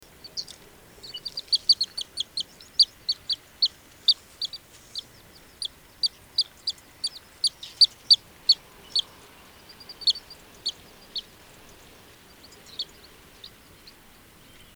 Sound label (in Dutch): Kleine Strandloper